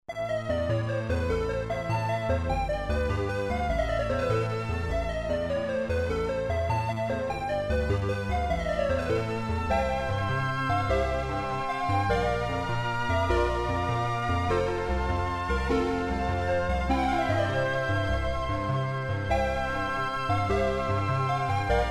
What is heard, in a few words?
synth square, kawai k1r; attack theme